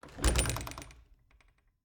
Cabin, front door, split door, oak, large, iron fittings, open, hyper; Eiravaein Works; Vaeyan IV